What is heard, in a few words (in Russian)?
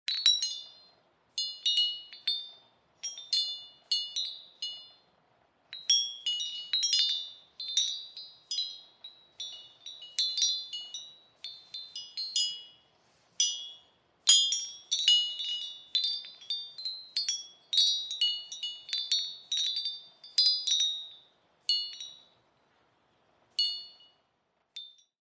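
металлические части задевают друг друга; Звук раскачивающейся люстры; для создания атмосферы ужаса; Звук раскачивающейся люстры для монтажа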